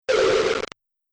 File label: Short Laser Interference; Laser Sound Effect; Sci-Fi Laser Sound Effect